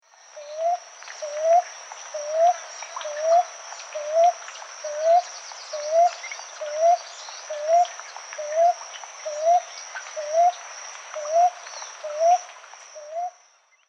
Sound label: Colombe queue noire Common Ground Dove, chants des oiseaux; Guadeloupe